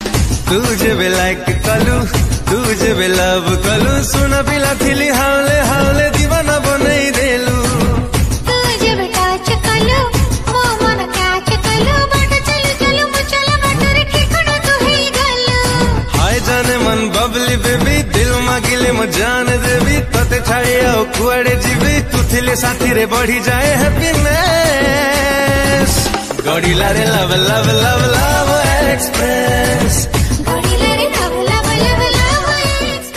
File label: Odia Song Ringtone